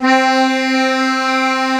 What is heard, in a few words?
tuba loop note